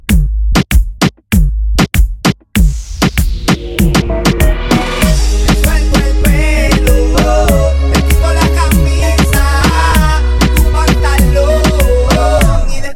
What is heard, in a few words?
REGGAETON